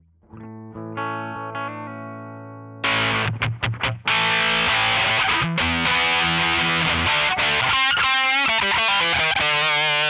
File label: Hammer Firm, Distortion Brutal High Gain Distortion; Black Hammer Distortion Brutal High Gain Distortion